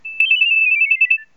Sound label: nokia3510i spiral ringtone